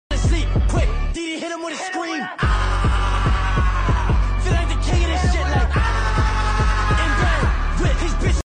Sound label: hit em with the scream